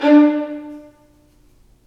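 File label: violins marcato, String Sections; Roland LCDP13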